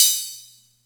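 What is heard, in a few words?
Atlantex Music Percussion Computer hi-hat open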